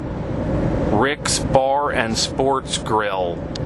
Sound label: Bar And Sports Grille; Ricks